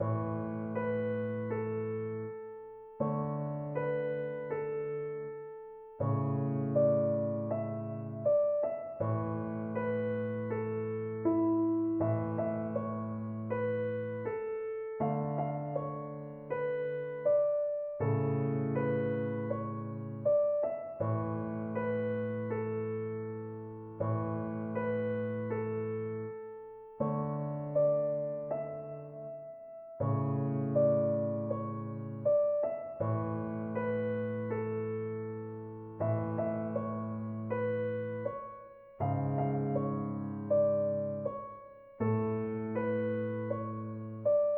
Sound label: short etude